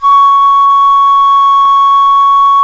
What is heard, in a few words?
flute, C flute